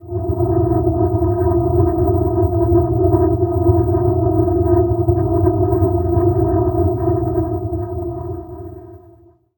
pillar drone F, ancient drones; Zero-G Seismic Frequencies; 90 s Sample CDs

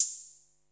HATS, DRUMS; Music Store Sound Service; Einstein

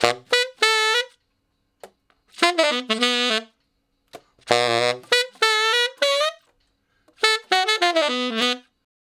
Ten Sax Straight (B), R&B; Solos

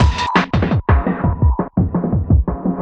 FX Beat, Drum N Bass; Sonic Foundry (Sony Creative Software); Knutrix Drum & Bass

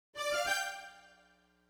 Smooth Groove Strings, 70s Analog Funk

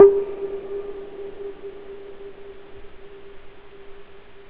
wood, percussion; Drum Hits

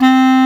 clarinet C3; InVision Interactive; Lightware VOL-1; Instruments & Percussions

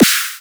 snare frankensnare

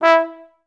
E3, STAC; Best Service; Peter Siedlaczek's Advanced Orchestra

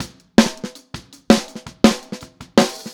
Snare, 162 BPM; Drums; Drums from the Big Room The Mixes; Take Your Toms Off; Verse

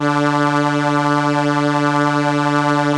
ARP D2, STRENS; Sonic Reality; Vintage Timetraveler

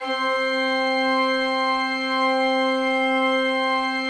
C3, Cathedral Organ; ILIO; Propeller Islands Cathedral Organ

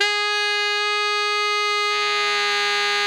TENOR G#4, saxophones; Greytsounds; Philip Wolfe David Crozier's Saxophones

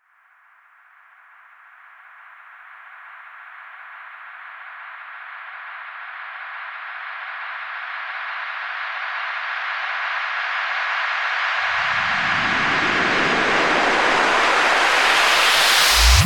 FX Sweep Up

